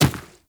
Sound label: Medium Run Dirt, Footsteps; Enemies; Warframe